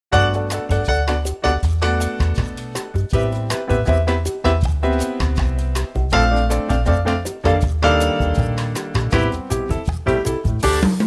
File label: G trio